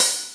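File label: Boss DR-660 Hat Open, Drum Machines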